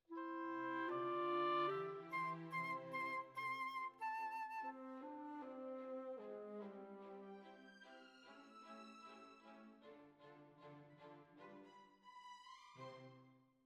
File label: Flute